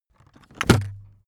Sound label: aluminium case close